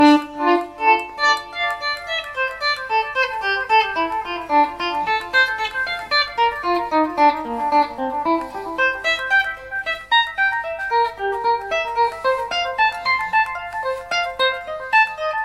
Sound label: violining, delay; delay pedals; guitar lesson